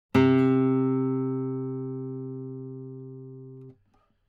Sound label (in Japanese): C3 on A 爪弾き, 撥弦; 弦鳴楽器; アポヤンド